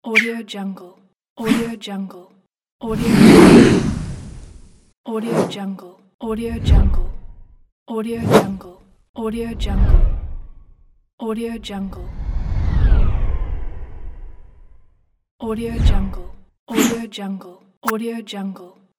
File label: whoosh